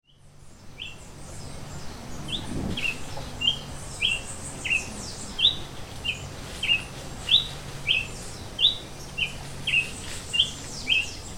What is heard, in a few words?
Tachyphonus coronatus Tiê preto